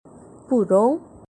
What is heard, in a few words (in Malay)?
burung